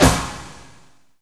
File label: BANG, explosions gunshots